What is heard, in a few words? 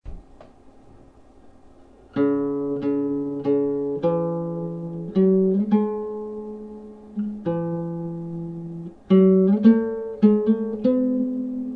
luxline sound, gut string